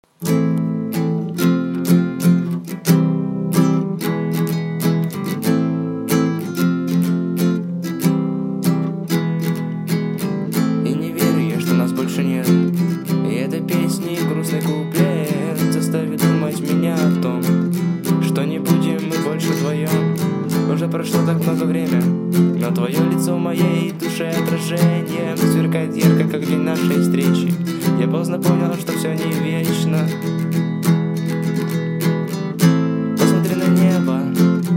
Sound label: acoustic